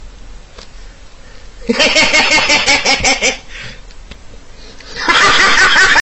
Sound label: guy screaming; mukbang